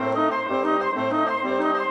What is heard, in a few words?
Winds, Orchestral; Sonic Foundry (Sony Creative Software) - Orchestral VOL-2